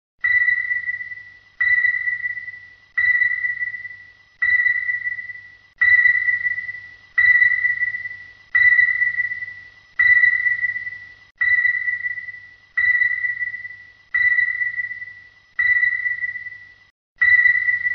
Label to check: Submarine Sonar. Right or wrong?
Right